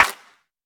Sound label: clap sample g key; single hits one shots